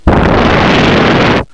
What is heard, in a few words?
grey beep; Monster Media No. 2